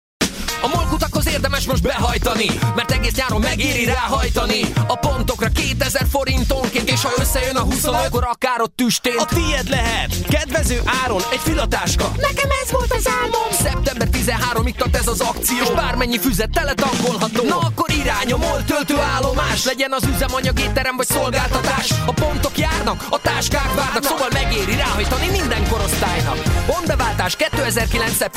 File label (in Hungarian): rap